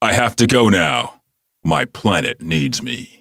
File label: bigband